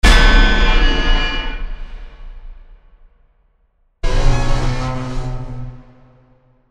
Stings Organic Atonal; Gravity 2 Menu

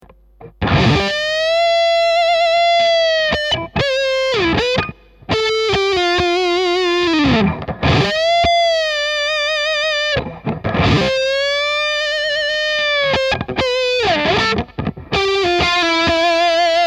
Neck Humbucker

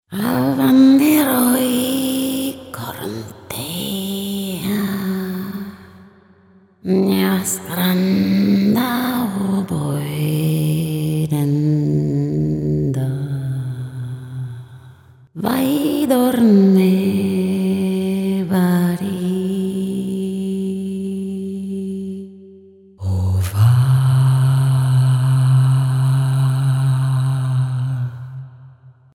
Lyrical Vocal Phrases whispering witches

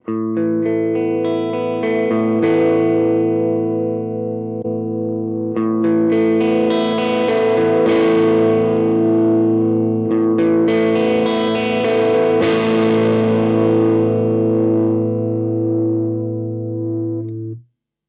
Compadre clean Boost flat both

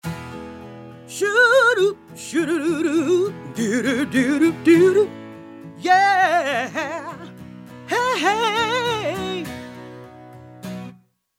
BOSS VE1 VE2 DRY

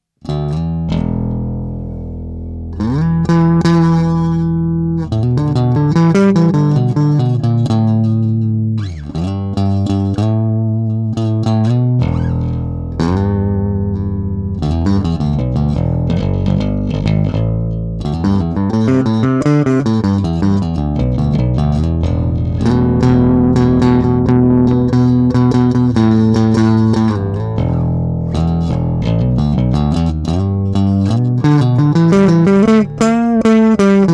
MB 4 SBK Deluxe Series Studio Bass Amp; Harley Benton; Reussenzehn

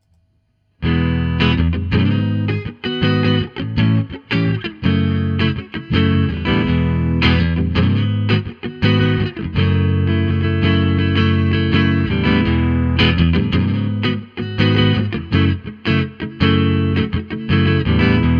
Sanken CU-44x Mk2 Guit Amp Edge

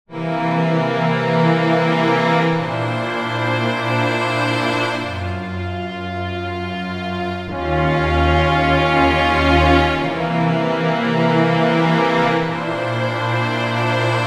Sequential Prophet X Warm Arco Strings

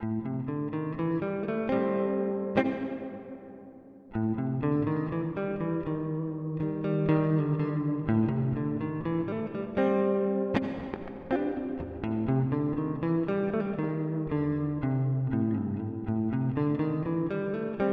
Golden Reverberator Spring

